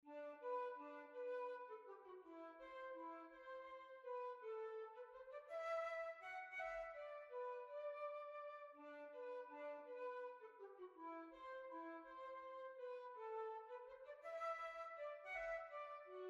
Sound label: Waltz